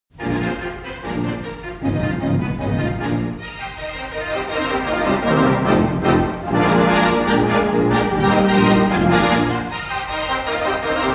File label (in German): Orgel Declick